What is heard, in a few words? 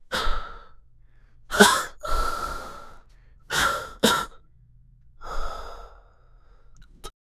scared person breathing; anxious